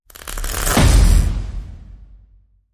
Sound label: Sparks Hit